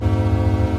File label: timer or energy low; no longer alone sfx